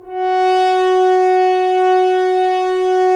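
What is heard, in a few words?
Solo French Horn, brass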